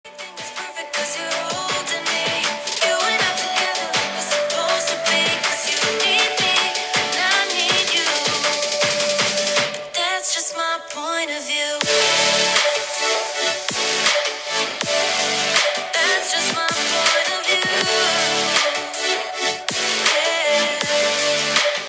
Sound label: iPhone 12 pro max Speaker